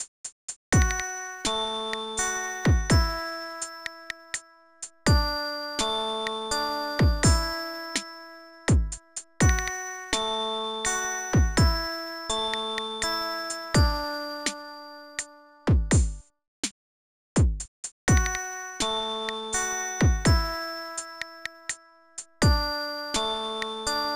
Space Jingle

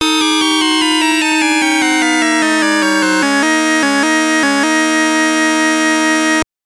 Jingle; top gun guts and glory; gb

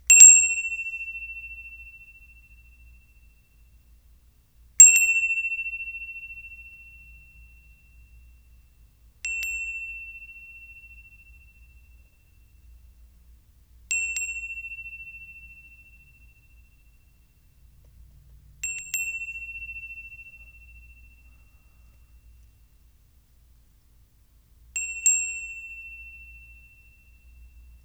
Bell Thai Small

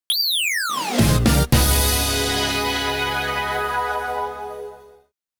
Goal Fanfare; We Ski

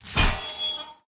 Cage Disappears; Peace, Death

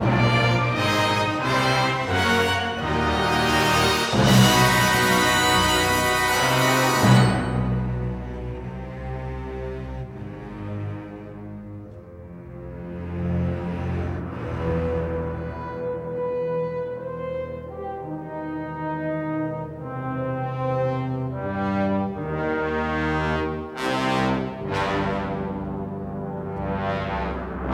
Closing Battle; Hoth; Star Wars Battlefront II

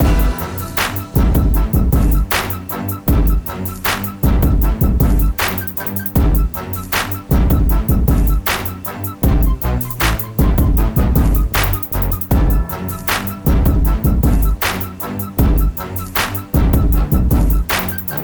Music Beat; Learn to Fly 3

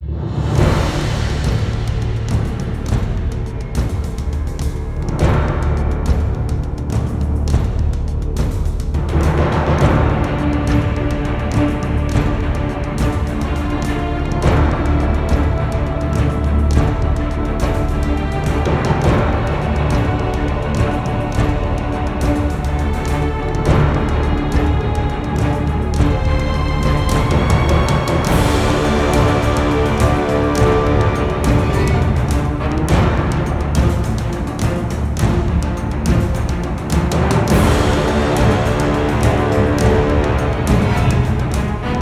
Battledrums; The Elder Scrolls Online Music of Tamriel Vol. 1

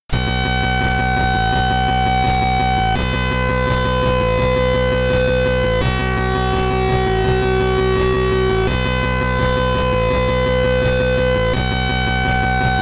Boss SID Stereo; Gemini Wing; Commodore 64

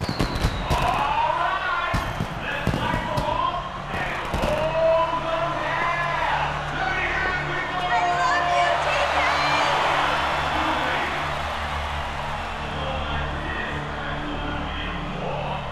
gameshow loss

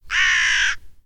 Crow

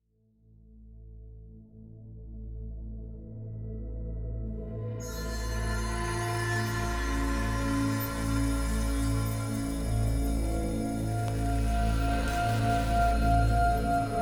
Music; Sifu

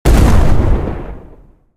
Explosion Large; Tank Stars; android

